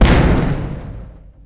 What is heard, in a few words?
hit plexiglass; hits; Tony Hawk's Project 8; XBOX